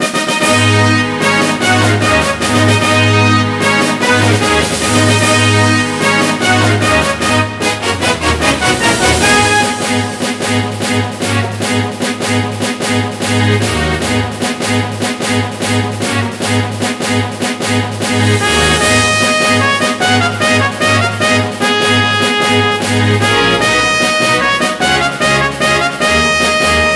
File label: Rpg Loop